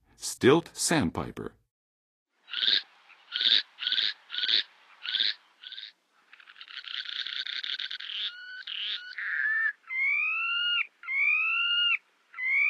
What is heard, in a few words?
Stilt Sandpiper, Birds of North America; Western USA; Western USA Songbirds